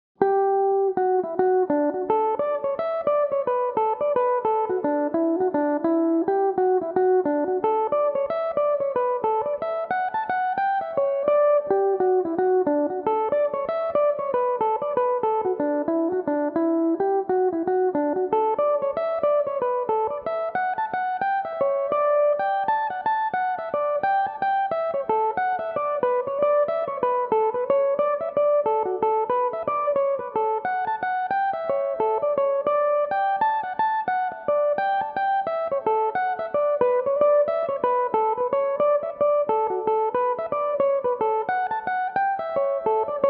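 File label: D major, irish mandolin, tunes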